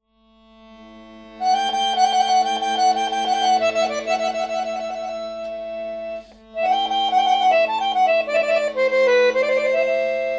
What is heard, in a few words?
georgian folk dances tushuri satrfialo